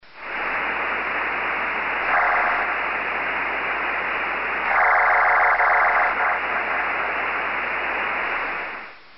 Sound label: FSK441, digimodes